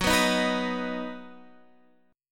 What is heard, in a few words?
D sharp Minor Sharp 5th F sharp, Guitar